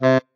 marimba bat

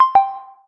otoyuka

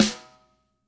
marimba drum; ゆめ2っき

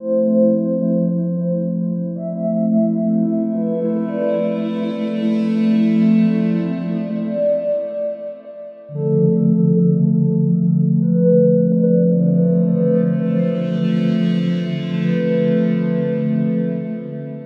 atmos; ゆめ2っき

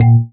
marimba raincoat; ゆめ2っき

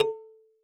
marimba kalimba; ゆめ2っき

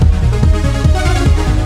trance loop; ゆめ2っき

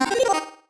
marimba dice; ゆめ2っき

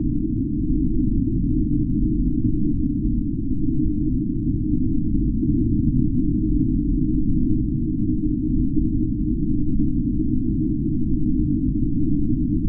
drone; ゆめ2っき

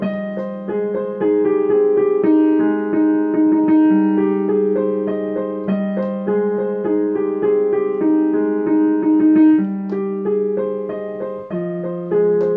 Piano; ゆめ2っき

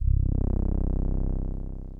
gate

